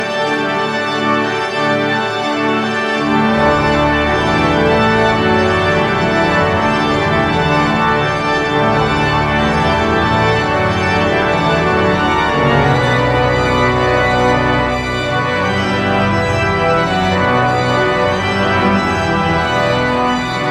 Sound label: Allegro; Kantorei und dem JuLifa-Chor